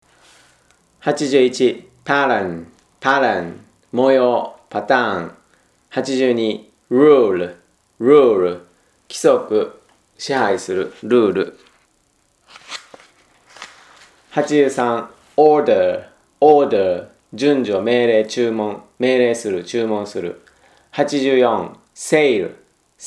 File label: tango